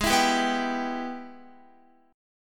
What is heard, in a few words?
Minor Major 7th Sharp 5th, Guitar; Gsharp; strummed; x,11,14,12,11,14; 4E,4A,5D,5G,5C,6F; G#mM7#5